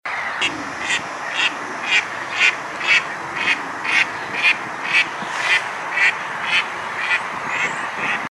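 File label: animal sounds duck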